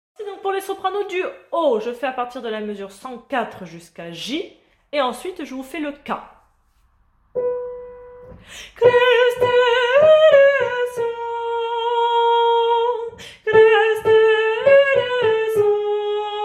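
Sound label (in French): soprano haut; Kyrie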